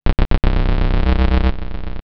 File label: Sci fi